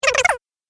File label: Gremlin Chatter, monster sound effect; Horror Sound Effect